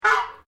BIRD GOOSE HONK, Japanese Geese; Animals

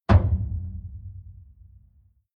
Deep Hollow Metal Impact Stinger Single Thud Drum

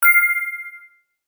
Multimedia Chime, Technology, Multimedia, Chime

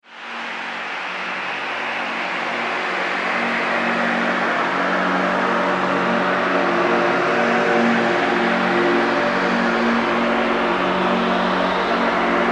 Demonic Transition

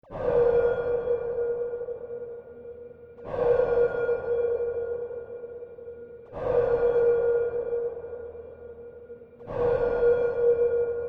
Sonar Pings SciFi Warfare Submarine Futuristic; Pings (Sound Effects:Multimedia); Marine Technology

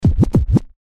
Record Dj Scratch Spin; Record Dj Scratch Spin Double Beat; Dj Sound Effects; Blastwave

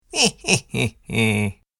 Heh Heh Heh Laugh; Evil Laugh Sound Effects

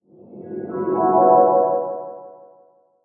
Pixie Dust Whoosh Filter Shimmer; Magic; Production Element Imaging Element Accent Transition

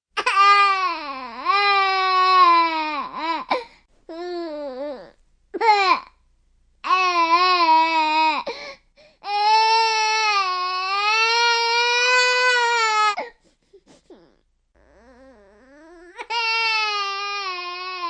Baby Crying, Baby, cry, 1 yr., long, wailing; kid; child; infant; human voice; Sound Effects:Voice; Voice Box

